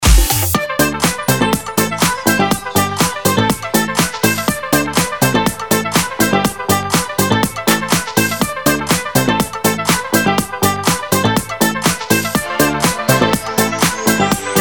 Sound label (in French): sonnerie, mariage